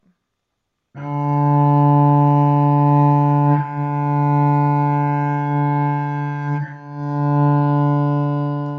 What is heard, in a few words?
regular note